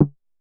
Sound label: Drum X Conga; Pearl Drum-X